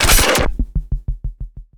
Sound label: Gun Weapon Pickup; Pickups